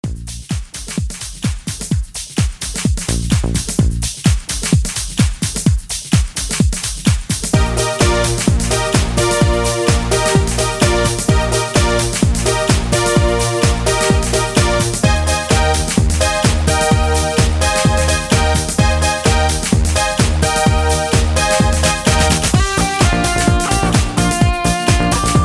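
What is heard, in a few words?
Patter No background vocals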